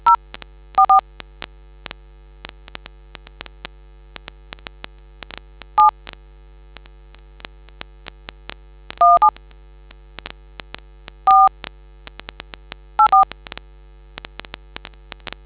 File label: dtmf